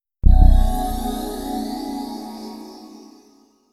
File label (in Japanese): サウンドロゴ; マイクロソフト; 不採用作品; I.E. 4.0